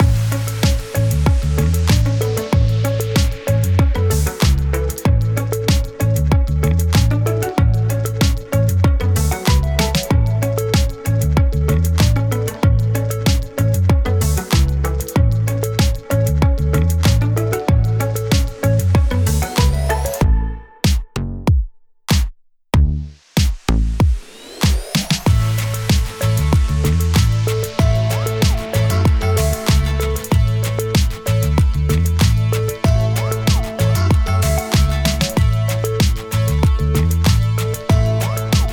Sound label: Treetop Twang; drawn to life two realms soundtrack 2020